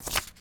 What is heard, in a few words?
Pickup Paper Note